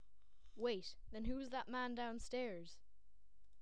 man dowstairs

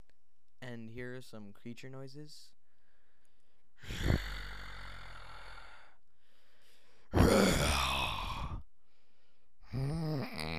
creature

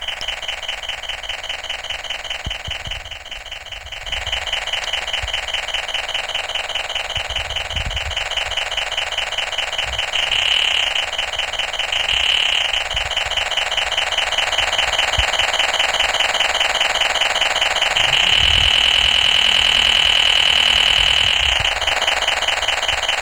sound diesel

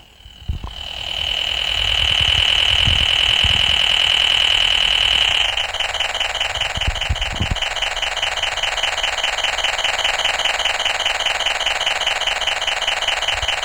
sound diesel

